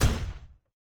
Footstep Robot Large

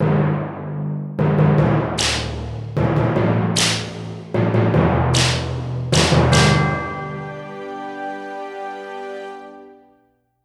Music Stings; Rooftop

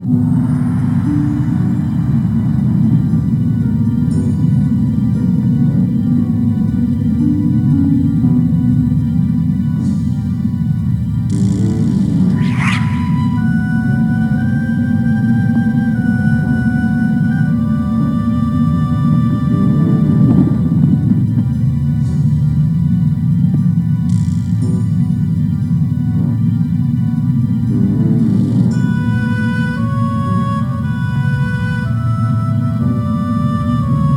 Dungeon; Disney's Math Quest with Aladdin

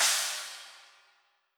Instr Cymbal; Backpack Hero

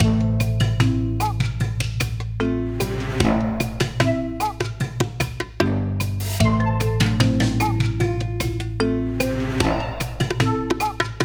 Forest Short Developed Loop; Charlie's Adventure

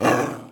Gameplay Wolf Growl; Zombie Catchers

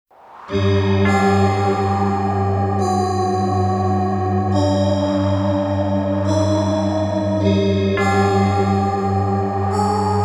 Forest; baroque

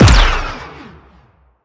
Sciweap Shot Medium Gun Rifle Ripper

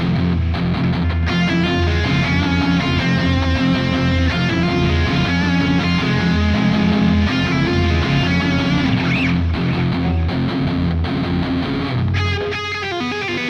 Music Transition Guitar; GnomeGnight

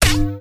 Laser Punch

